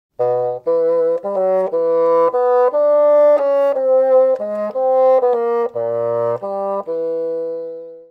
basson

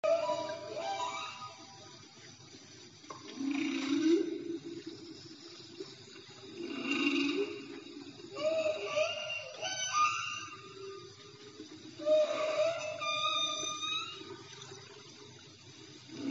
trompette

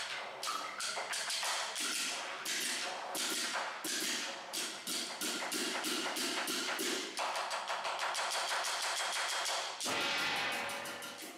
spectacle percussions; chine; xian

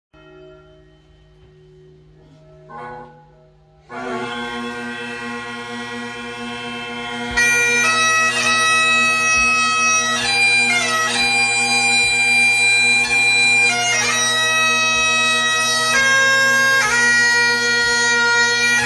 air irlandais à la cornemuse

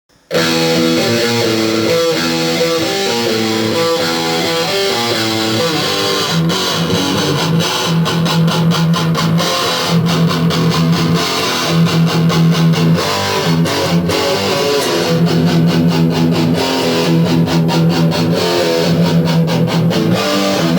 guitare electrique

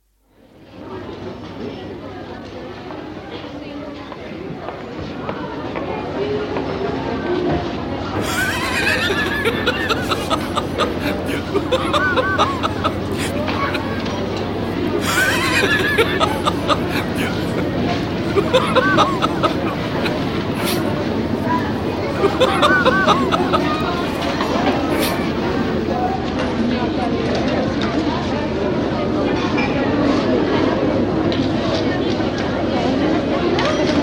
ambiance intérieur magasin + rire

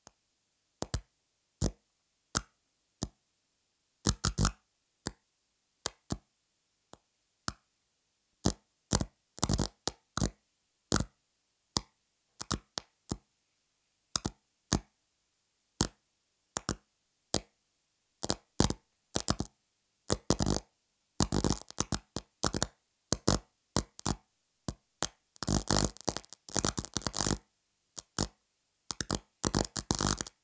choc electrique

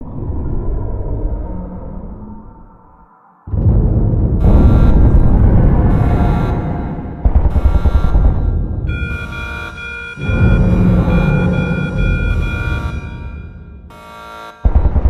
alarme brèche; scp vf; scp 682

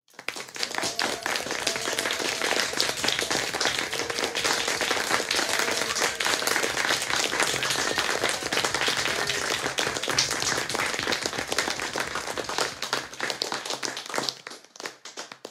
Applaudissements, Bruitage gratuit, Effects sonores

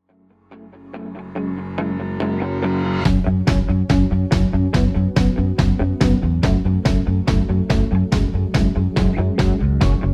Décompte 1 heure avec musique d ambiance; Escape Game; Thème Super Héros; Escape Kit

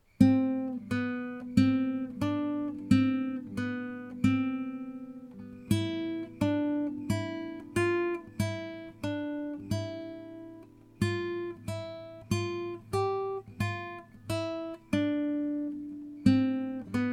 Petite Etude en La mineur

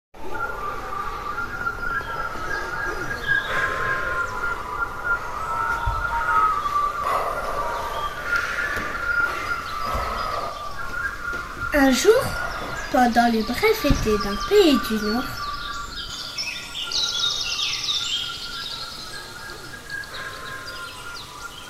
montage foret et vent

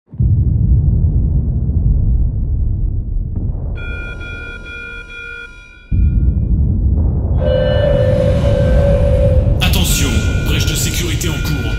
Alarme; SCP VF; Attaque d un groupe d intérêt

